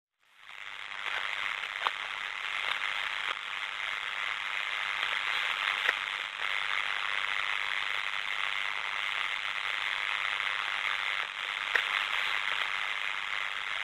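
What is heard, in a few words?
Radio Static Walkie Talkie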